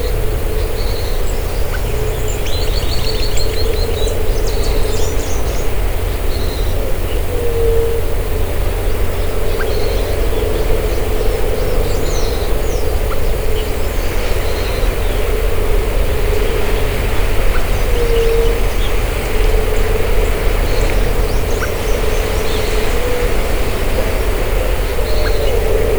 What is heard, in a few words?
bird